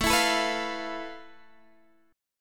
F Major 7th Flat 5th A, guitar chord

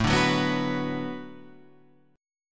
B-Minor

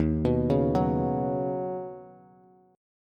Eb Minor 7th Sharp 5th Eb Bass; arpeggiated

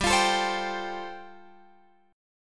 G 7th Suspended 2nd Suspended 4th, Guitar Open F; G7sus2sus4 strummed

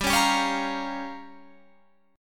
A sharp Minor 6th G

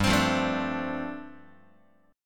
G 9th Suspended 4th, Guitar; strummed; G9sus4

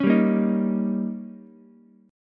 D sharp 7th D sharp, Ukulele; strummed; JGuitar

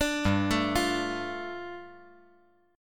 G 7th Suspended 2nd

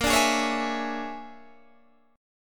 Bb 7th Sharp 9th Flat 5th Bb Guitar DADGAD, chord; 20,17,18,18,19,18; Bb7#9b5 strummed; JGuitar

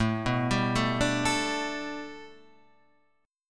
A 7th Suspended 2nd Suspended 4th; x,x,7,4,7,4,4,6

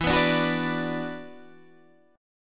E 7th Suspended 2nd, down, Guitar, Open D

